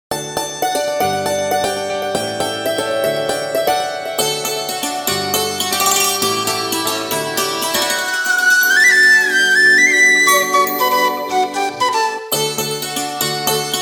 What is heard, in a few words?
ringtone joy of beautiful day